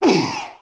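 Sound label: Core damage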